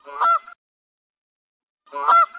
dvojnoj otrivistij gogot

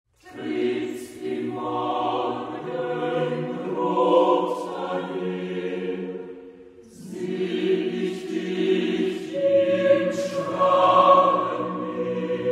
gimn shvejcarii

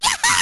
pisklyavoe kvakanie igrushechnoj zhabi

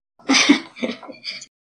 devichij smeh

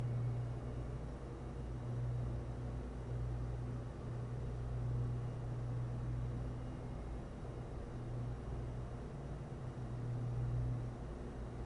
dalyokij dolgij besprerivnij shum raboti proizvodstva

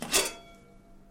metall otkritie myagkij

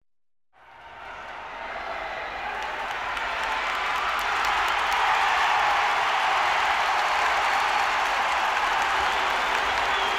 zvuk bolelshikov na stadione

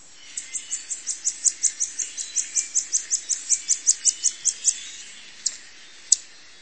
utrennee shebetanie kogda otkrili okno

